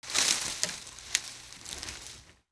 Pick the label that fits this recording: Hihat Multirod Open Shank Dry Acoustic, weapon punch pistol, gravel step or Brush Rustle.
Brush Rustle